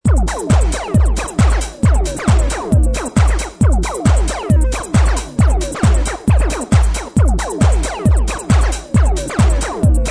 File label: trance groove 135; trance loop